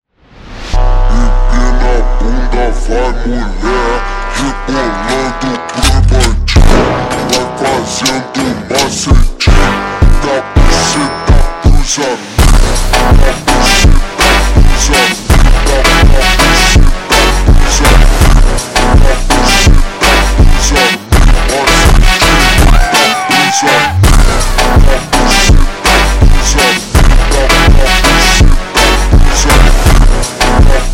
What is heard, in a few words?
Funk, ultra Slowed